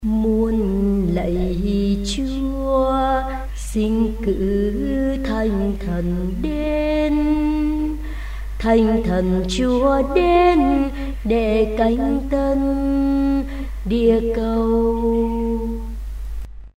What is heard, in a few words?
alto